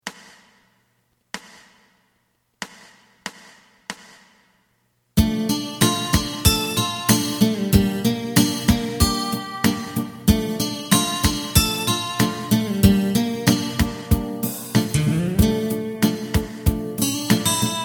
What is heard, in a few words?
bass